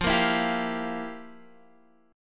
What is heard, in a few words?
F Major 7th Suspended 2nd Suspended 4th Guitar Open F, chord; FM7sus2sus4; x,12,10,11,10,12; strummed